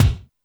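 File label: kick Techno, Alesis D4; 300 Drum Machines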